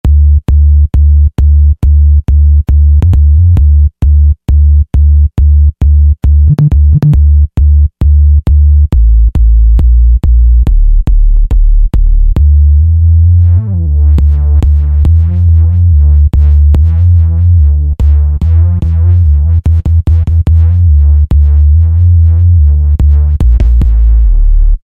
Kick Bass, Roland V-Synth; Factory Patches

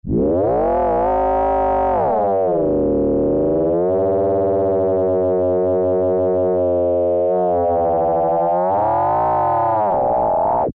Supergrowl; Alesis airSynth

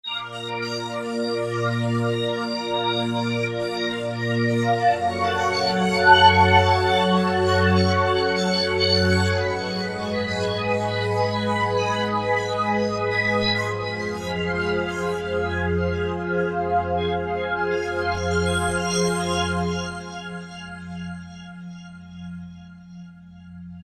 Kosmik Fluid, synth fx

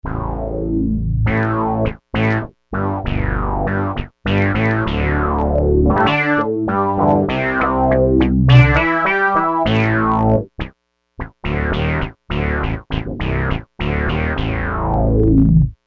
Mutron moog, synth

